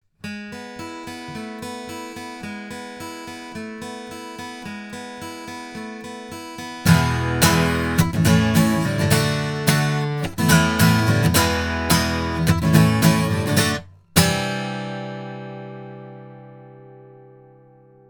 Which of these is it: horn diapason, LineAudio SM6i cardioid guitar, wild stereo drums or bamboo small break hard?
LineAudio SM6i cardioid guitar